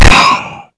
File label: fall; cobra